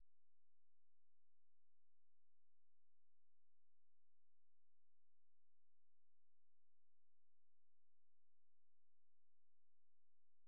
Synt; TOB STUDIO

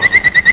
vipvipvip

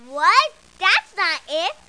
bummer

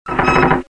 gear; CYPHER

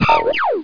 EEEK

